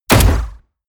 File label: rifle assault rifle fire; comedy cartoon; pistolen gewehre; Pistols and guns